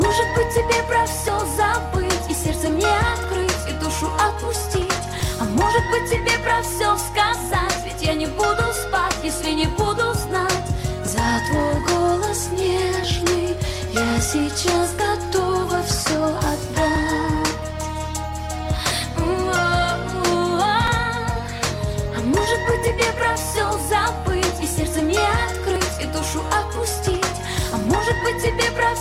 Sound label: Russian Song